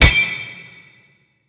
scatter Hit; netent

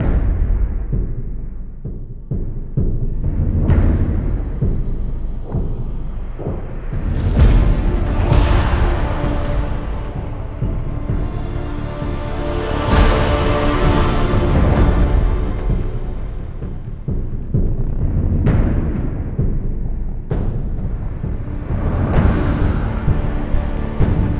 main game; netent

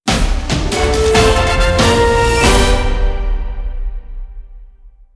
fanfare silver; netent

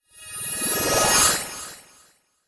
combo Tease; netent

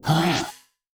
fs Blue Charge; netent

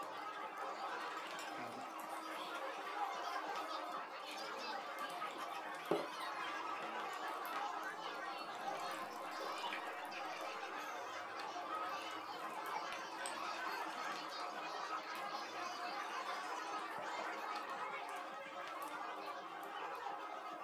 ambience tavern; netent

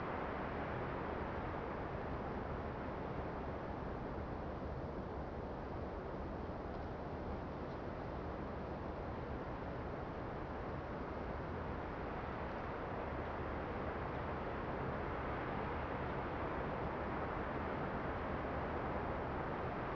basic ambience; netent

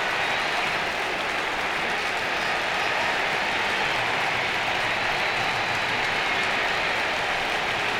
ambience level; netent

